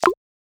Grab

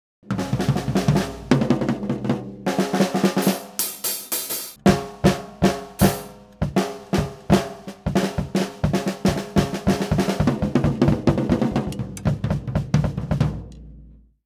drum play music; gamerip; The Sims 2

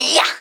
Rogue Attack; Pecheneg

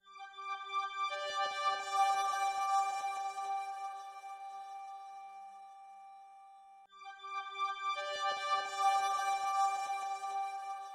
Progression Stinger

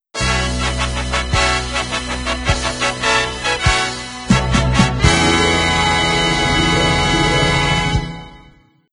Town Complete; Buildalot

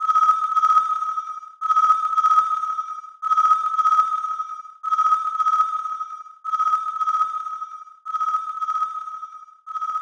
Music Frontend

